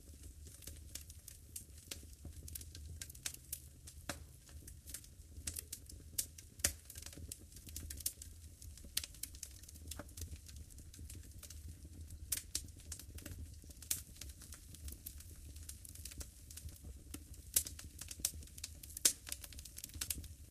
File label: fireplace